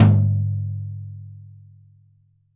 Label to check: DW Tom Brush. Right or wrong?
Right